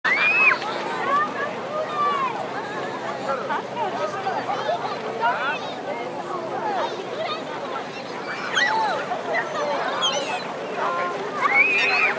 Waterside; Blastwave FX

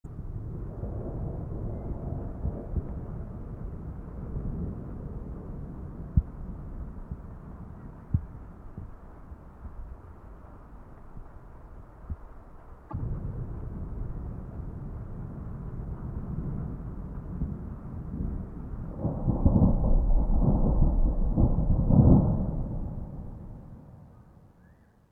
Thunder Rumble; The Producers Library

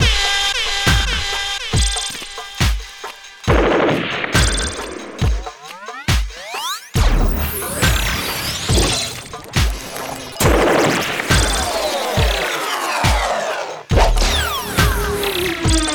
Dancehall Sound Effects Machine; The Producers Library

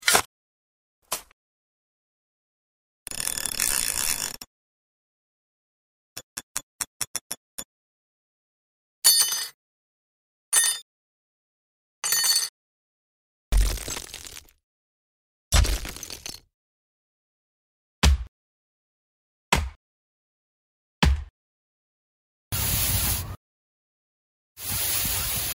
Survival Sound Effects; GameDev Market

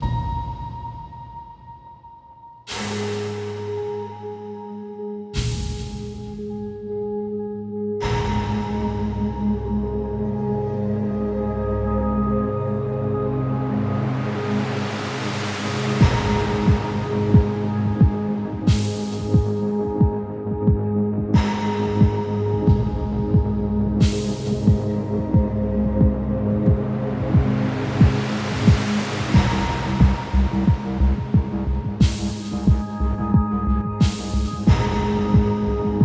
Cinematic Drama; Epic Stock Media; Scoring Tools

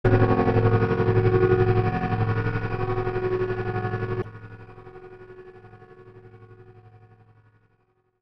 Choppy Effects; Blastwave FX

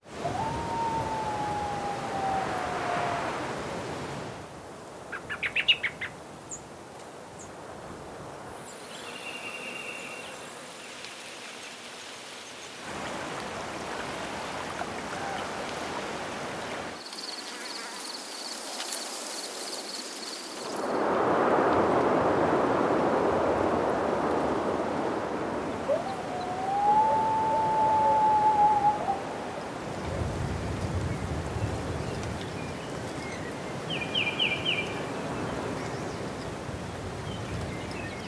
Seasons Of Earth Summer; Stereo; Boom Library

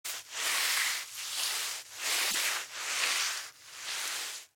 House Cleaning; Blastwave FX

